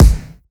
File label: Kick Burst